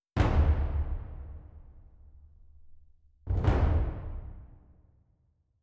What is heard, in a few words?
taikos; Netease; kill boss tortoise